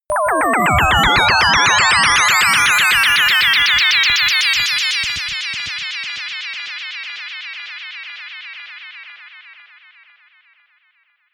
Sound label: atonal rhythm riser short